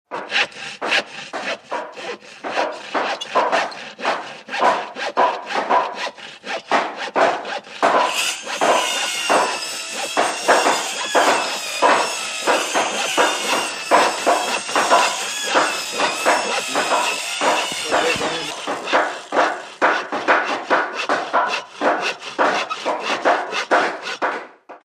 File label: Indoor Construction, construction large